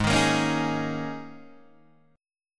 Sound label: Ab Suspended 2nd Suspended 4th Ab down Guitar Open D